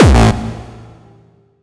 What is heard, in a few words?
pretty solid kick actually reverb no tail 200 A, kicks